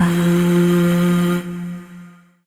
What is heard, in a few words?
Void Shawzin Oct One F; Warframe; Zariman